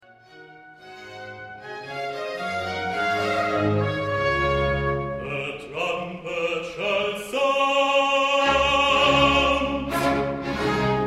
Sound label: The trumpet shall sound; Der Messias; Livemitschnitt